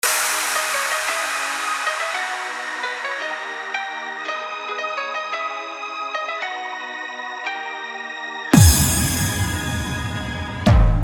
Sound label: Hindi Wedding Mix